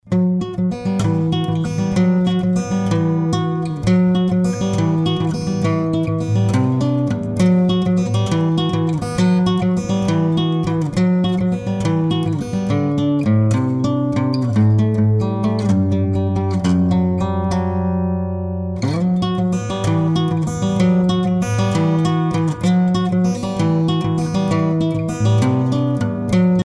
CORDE TENDUE

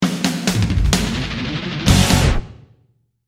VIRGULE ROCK

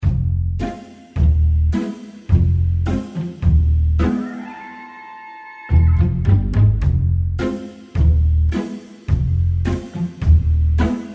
ATTENTE NARRATION INTRIGUE

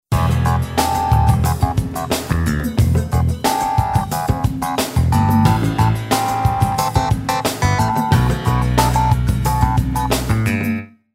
LE CLAVINET

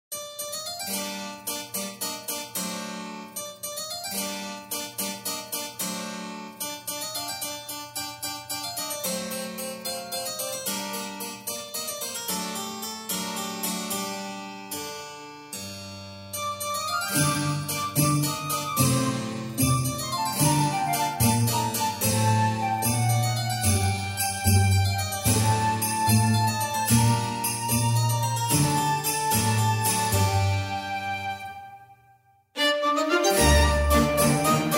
PETITE DANSE BAROQUE

